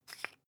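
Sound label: toothpaste open twist hard, Bathroom; haus-garten; badezimmer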